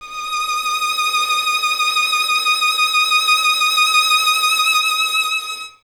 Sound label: violin, Solo Instruments